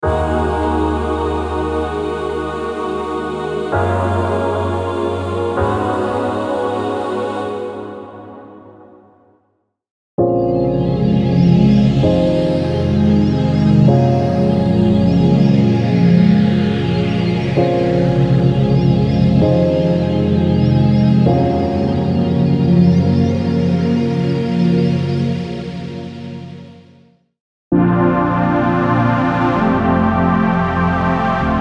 Trance Psytrance

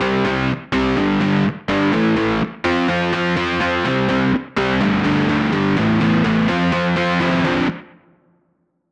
Chords Rhythm Rock; midi wizard

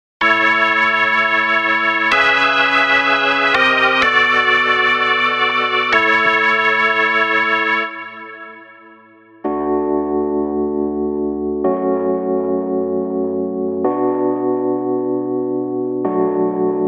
Chords Soul

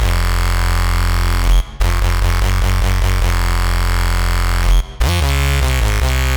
Dubstep

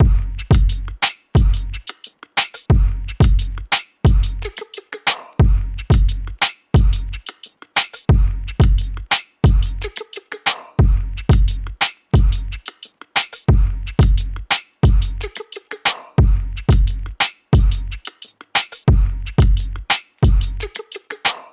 Drums Dirty Before

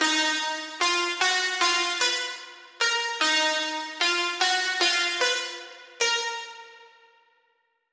Melody Pluck UK Drill; midi wizard

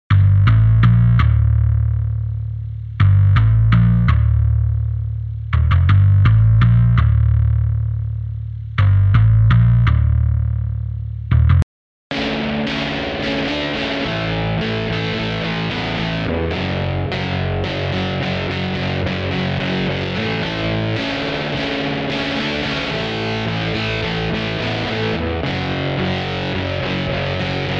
Rock & Metal Full; unisynth

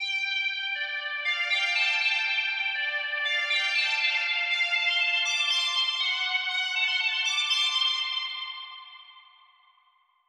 Melody Lead RB; midi wizard

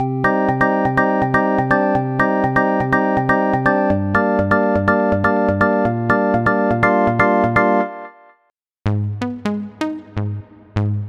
House Deep House

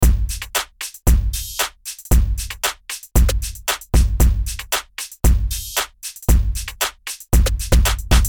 Pop Future Pop 115BPM

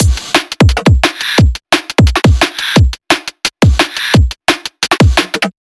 Drums Lo-Fi After

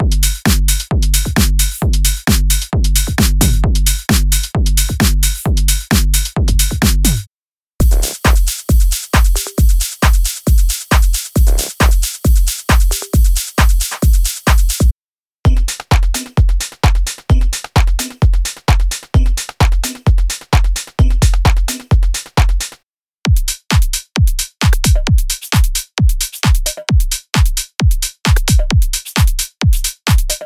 Drum Techno Melodic Techno; Drum Monkey; Unison MIDI Wizard Plug-In; Drum Demo

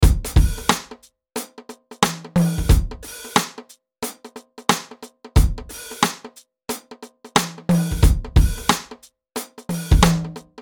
Reggae 90BPM

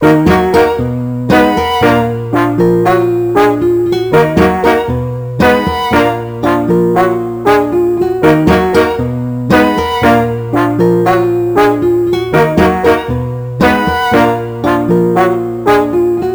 117 BPM Ebm

